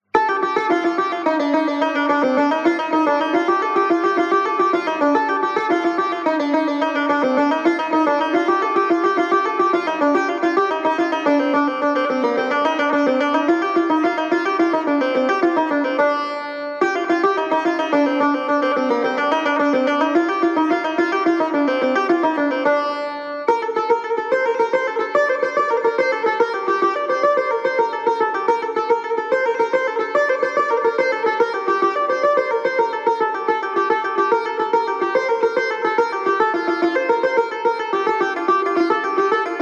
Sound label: study of dashti tar; first book